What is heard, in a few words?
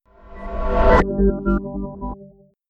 Intro news sound